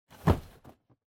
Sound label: Cardboard Box Pick Up; ダンボール; 持つ; 梱包材